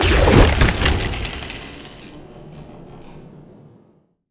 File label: bushwhack target; weapons; dota; hoodwink